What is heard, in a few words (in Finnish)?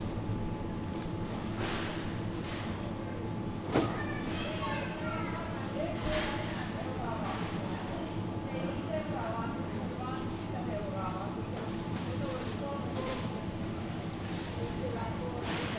kauppa